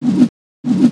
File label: Charge trident